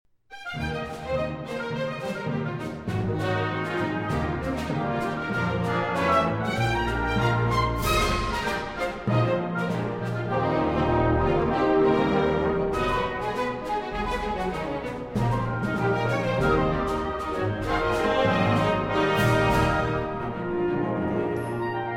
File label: Symphony in C Minor, Juba. Allegro; Symphony No. 3